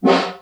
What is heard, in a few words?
SN1 Far, Snare